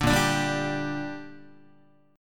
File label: A sharp 6th, guitar chord; strummed; JGuitar